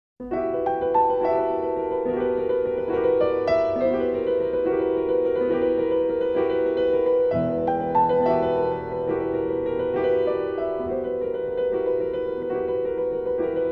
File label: Melody of Agony